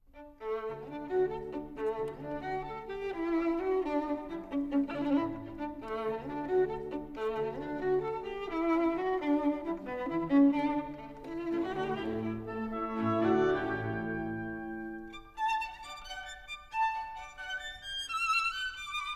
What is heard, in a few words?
Violin Concerto In D; Academy of St. Martin in the Fields; Beethoven Violin Concerto